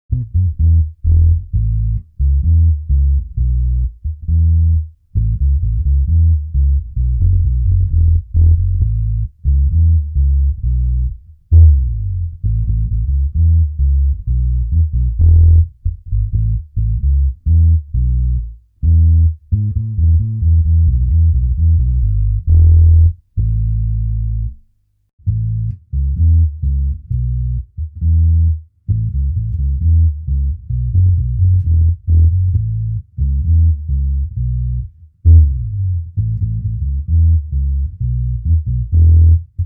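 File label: Ampeg PF J Bass Reggae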